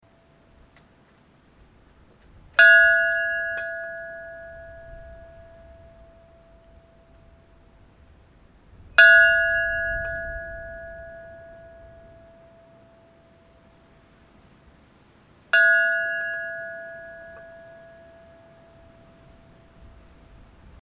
baejarkirkja likhringing, kirkjuklukkur

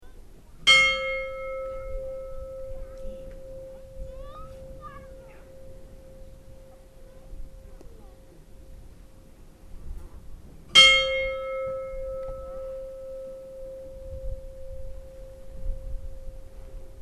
dagverdarneskirkja likhringing, kirkjuklukkur

